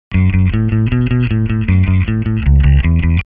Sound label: bass